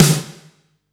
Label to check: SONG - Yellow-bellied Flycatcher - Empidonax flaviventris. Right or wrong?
Wrong